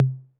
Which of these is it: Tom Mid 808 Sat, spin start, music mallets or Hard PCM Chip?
Tom Mid 808 Sat